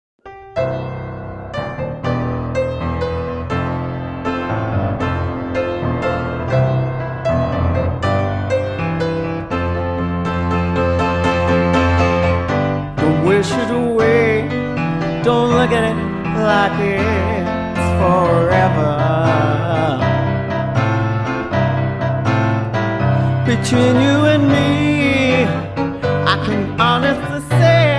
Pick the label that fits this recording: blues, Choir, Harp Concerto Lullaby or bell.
blues